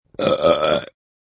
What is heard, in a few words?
Burp